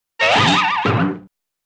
Boing Two Bounces; impacts

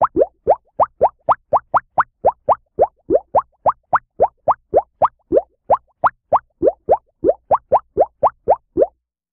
Funny Bubble Rando; bubbles

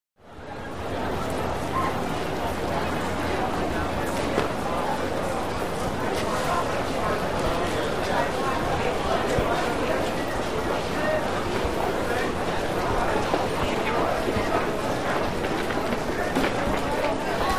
Exterior Large Crowd

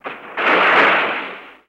Car Bottoms Out, skids autos

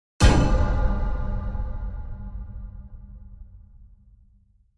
Hit Echoed Metallic

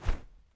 Baseball Arm Cloth W; baseball fx & games

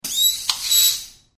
Air Release Valve

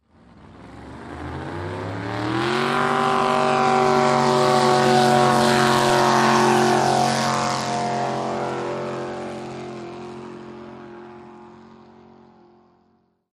Drag Boat Start revs

